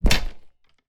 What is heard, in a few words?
spear attack